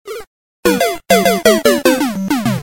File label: death notification ringtone; mario